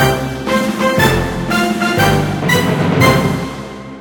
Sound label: orchestra; Samsung GT-i7680; cmcc music